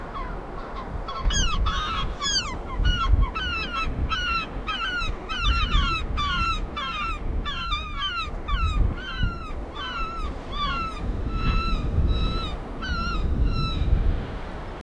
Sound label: claw machine in the middl, machines